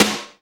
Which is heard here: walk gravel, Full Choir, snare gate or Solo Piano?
snare gate